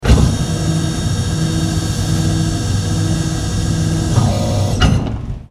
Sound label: door servo driven